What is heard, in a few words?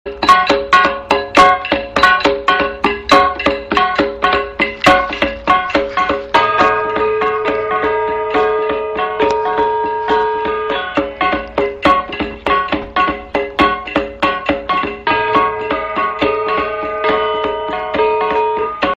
Filipino Folk, ringtones